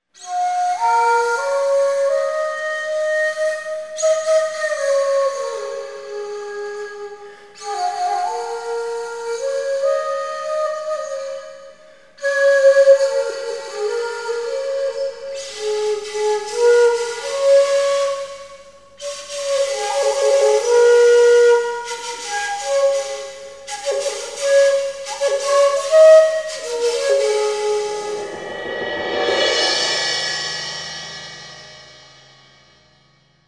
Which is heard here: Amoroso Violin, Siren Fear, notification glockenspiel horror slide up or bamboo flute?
bamboo flute